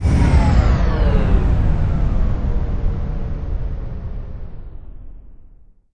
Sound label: generator down; ambient; shutdown